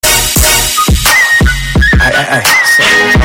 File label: BASS Whistle